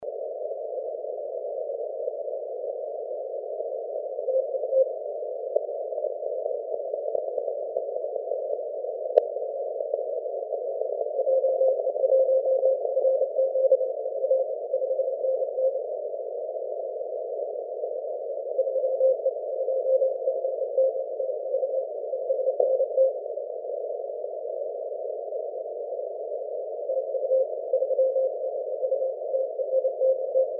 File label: 30m cw; 3d2ro